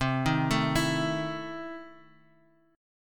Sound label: E Major 7th Suspended 4th Sharp 5th, C